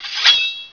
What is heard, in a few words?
SWORD